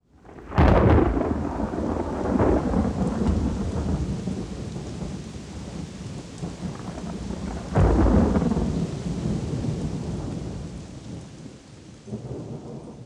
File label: near thunder